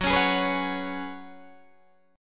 F sharp Suspended 2nd Suspended 4th, Guitar DADGAD